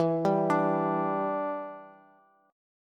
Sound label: E Minor 7th Bass; x,19,17,19; arpeggiated; JGuitar